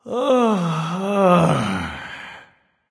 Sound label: big sigh; chronos